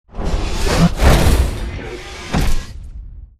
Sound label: melee stomp; Titanfall 2